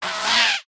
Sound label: cartoon fart